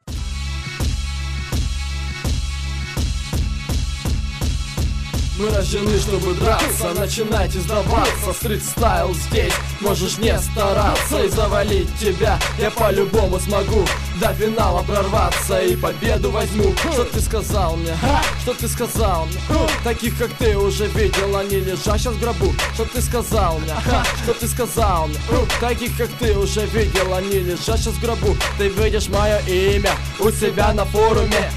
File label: Rap battle; Street Style battle